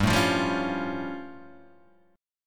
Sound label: A Augmented 7th G